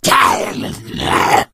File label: hunter pounce